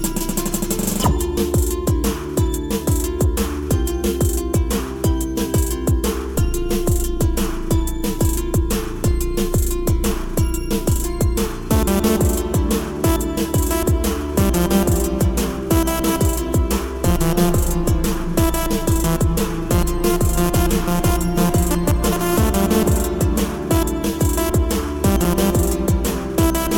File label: Drum Bass